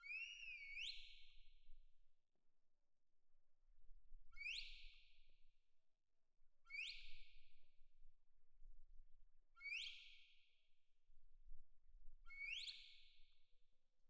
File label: Perc Main